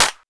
vermona drm1 mk3 clap

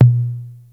tom, Yamaha RY-8

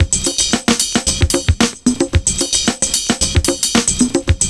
RIDE BEAT; Univers Sons

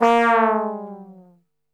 A#1, Brass Super Section; Best Service

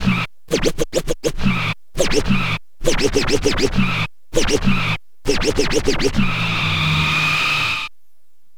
scratch med, Vinyl Attack scratches mix

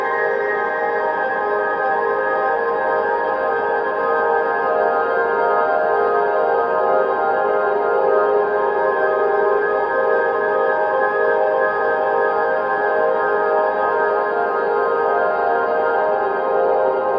Useless Pad

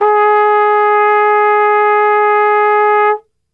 G#2, Brass; Best Service; Brass Super Section

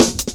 Jazz Shuffle Cut, Drum Loops; Jungle; 166.5; Zero-G Jungle Warfare 1, 2 & 3; 90 s Sample CDs

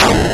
snr; Mono

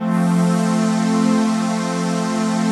CHRD PAD; Best Service; XX Large Pads; Sample CDs

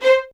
LDVLN1 ATT C, LOUD VIOLINS; Q Up Arts; Denny Jaeger Master Violin Library

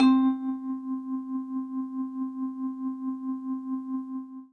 C4, Synth Line

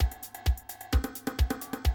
130, Classic DrumLoops

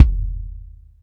BD, Bassdrums, Roland TD-20

